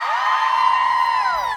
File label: Fans Screaming